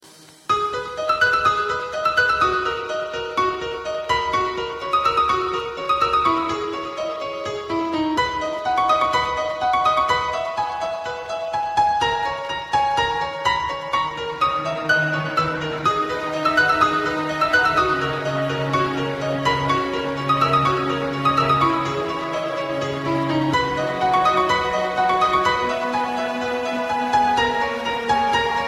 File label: Chillout